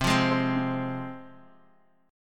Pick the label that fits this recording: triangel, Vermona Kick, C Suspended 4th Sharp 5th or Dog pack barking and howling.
C Suspended 4th Sharp 5th